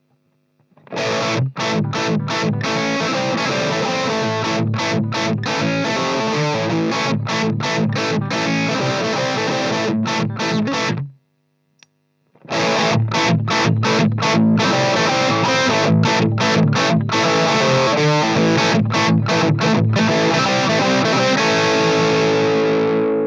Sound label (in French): 7 watts to 15 watts intermediaire, tinyterror